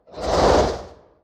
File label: brain move